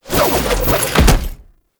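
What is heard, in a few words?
Teleport Jump; Enemies; Abilities; Warframe; Tau; Dax Ninja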